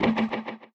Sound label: Perc, drums; Touch Loops; BlissedOutBeats